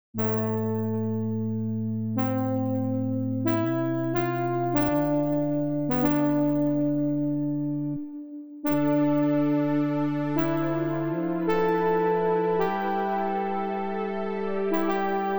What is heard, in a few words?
trumpet climb